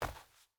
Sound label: footstep burlap concrete jump land; Rust